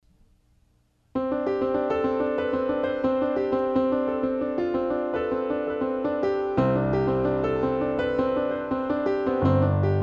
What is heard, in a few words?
Jam Solo; Housewarming Party 2008